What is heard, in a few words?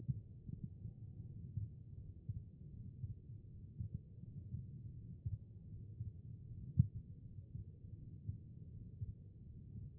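HEART, heart sound; B MODE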